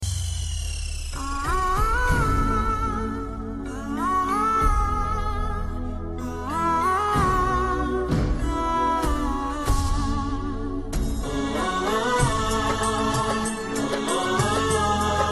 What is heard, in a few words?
german nasheed